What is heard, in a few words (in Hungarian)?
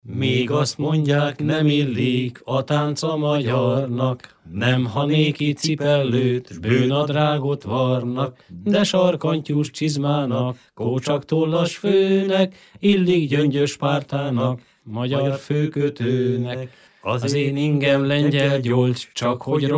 nepdal; meg azt mondják